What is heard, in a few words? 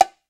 E BONGO, World Percussion